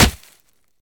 Weapon Hit

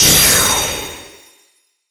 Magic Attack

